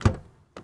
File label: Truck Ford250 Door Open; Trucks